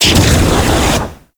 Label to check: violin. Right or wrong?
Wrong